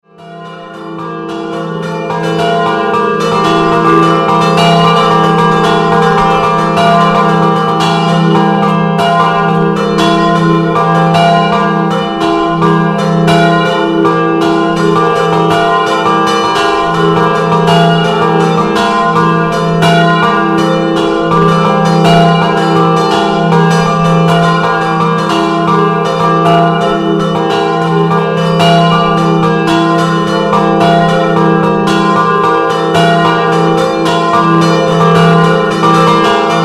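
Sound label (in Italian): Bueriis scampanottata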